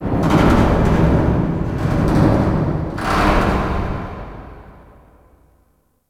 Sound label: Warehouse Metal Random